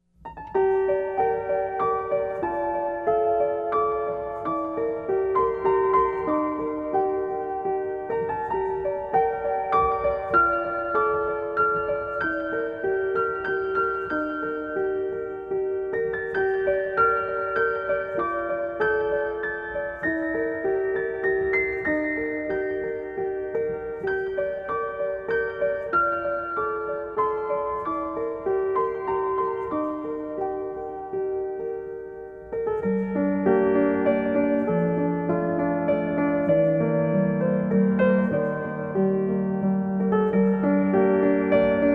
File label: meditation music Rain 432hz; subliminal